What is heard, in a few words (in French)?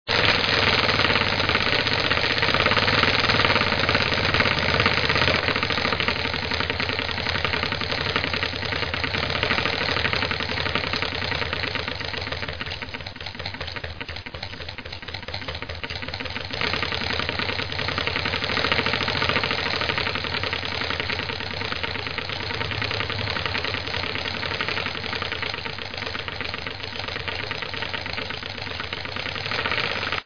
vibreur